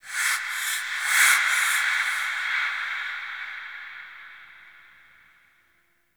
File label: Breaths, ambience; Warframe; TheNewWar; Zariman